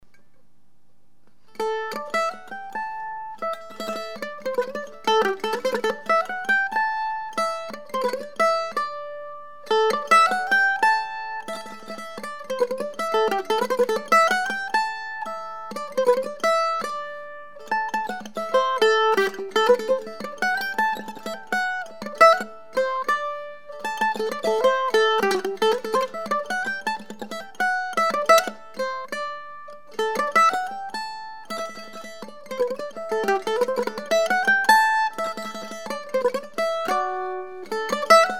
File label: Waltz, custom mandolin; Peter Coombe Mandolins and Guitars